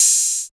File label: OP HAT; East West - Phat & Phunky CD2; 90's Sample CDs